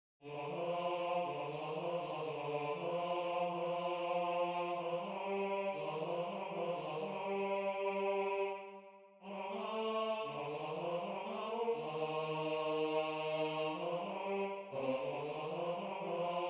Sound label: tenor